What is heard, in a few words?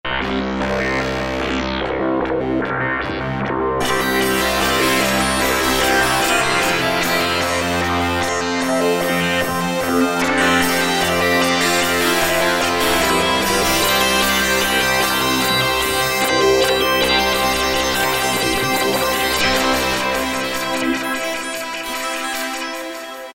Kurzweil K2000, SYNTHS; Value Pack; Extra Programs; SYNTHS1X